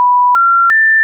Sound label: tele zap